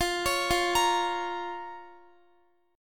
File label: F Suspended 4th Sharp 5th F, Guitar; Fsus4#5; arpeggiated; x,x,15,18,17,17